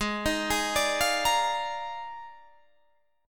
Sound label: F Minor 13th, G sharp